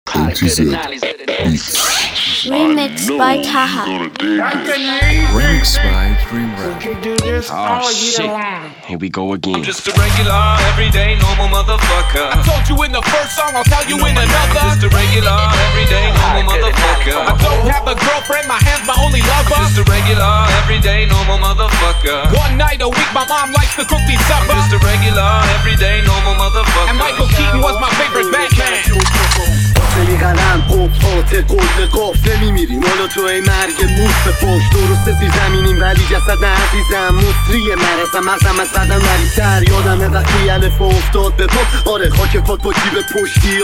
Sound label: Dream Rap Remix